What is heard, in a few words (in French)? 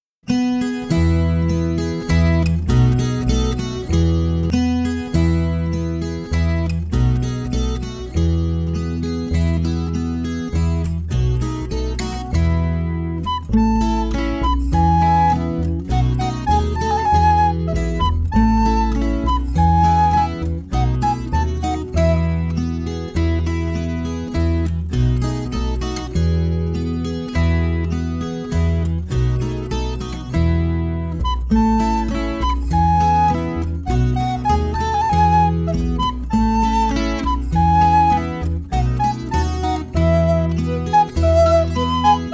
Le coucou Version instrumentale